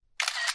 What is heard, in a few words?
camera click; China Mobile; A3s